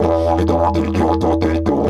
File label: E 130, Didgeridoo